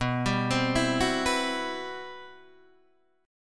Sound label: C-Major Sharp 11th B, guitar